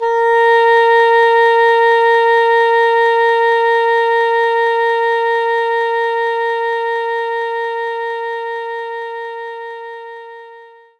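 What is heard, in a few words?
English Horn A#3; knights try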